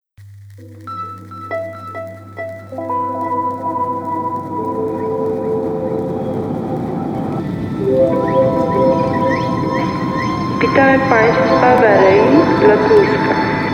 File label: Airport Lounge